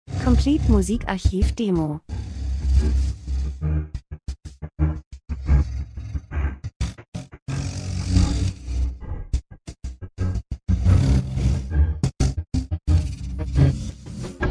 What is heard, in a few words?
drums; Elektro Rhythmus Chaotisch Unwirklich Unangenehm Spannend Menschen Zeitgeschehen Maschine Dokumentation Industrie